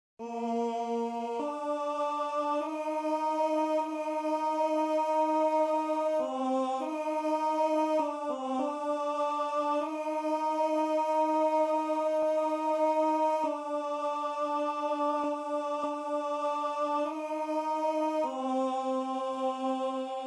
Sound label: o jesu christe tenor